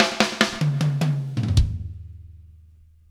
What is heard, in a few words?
Fills 103; Zero-G; Monster Beats